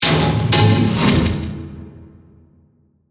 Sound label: Hit To Whoosh Dark, Drum, Musical; Production Element, Imaging Element, Accent, Transition; BlastWave